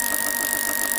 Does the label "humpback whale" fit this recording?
No